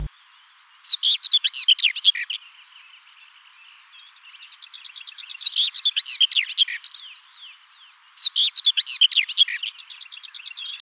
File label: c whitethroat